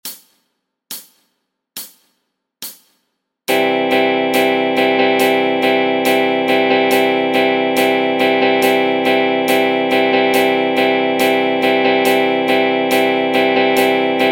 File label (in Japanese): ドラム と ストローク